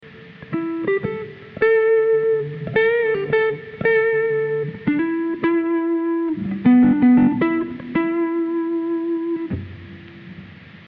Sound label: 12bar